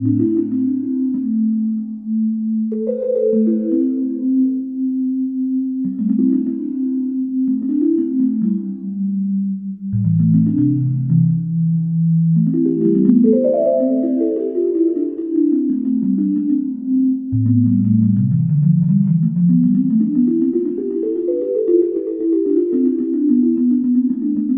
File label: Fresh Flutey, Synths